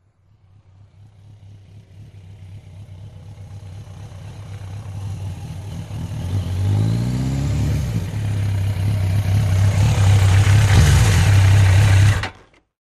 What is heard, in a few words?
Motorcycle Road Racing